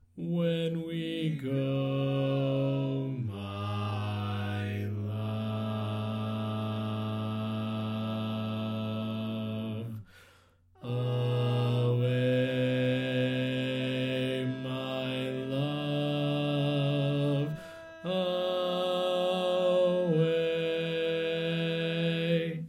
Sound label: Bass, barbershop